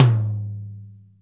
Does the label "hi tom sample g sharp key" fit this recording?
Yes